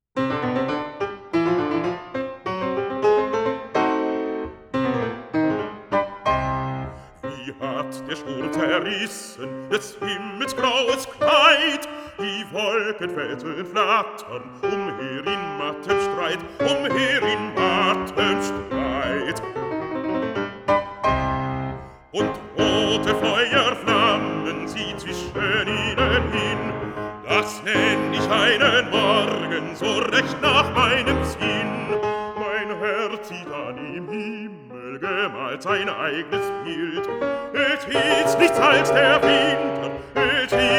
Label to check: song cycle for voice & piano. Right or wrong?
Right